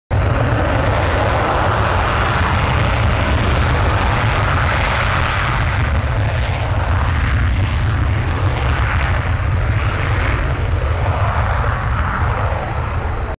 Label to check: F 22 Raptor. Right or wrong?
Right